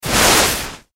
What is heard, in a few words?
Ski Skid Stop, Ski Skid Stop Brake; Skiing And Snowboarding; Sports; SoundEffects-Blastwave